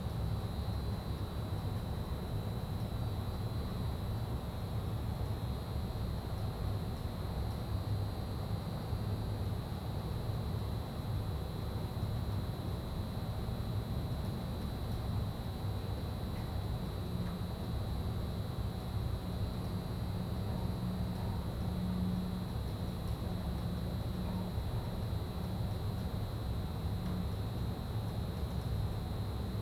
Industrial Spaces, Industrial Drones and Ambiences; Sound Spark LLC